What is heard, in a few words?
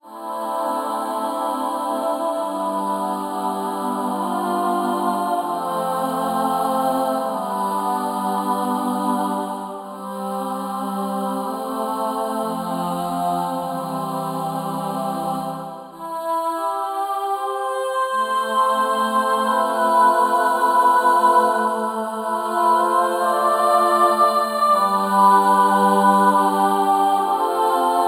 Natural Ahh Stereo; KORG OASYS; Natural Ahh 2 Stereo; oasys assault